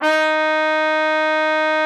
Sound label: D#4, TRUMPET; Xsample VOL-3